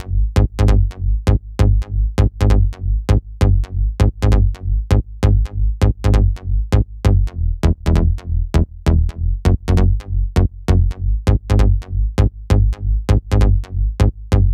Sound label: 132BPM Bassline, G#major; Vengeance Samples; Jericho Kit